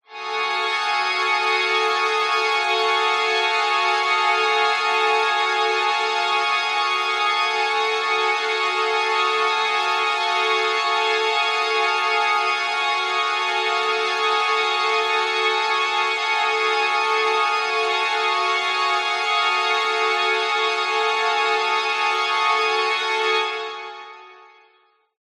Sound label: horror suspense strings; zapsplat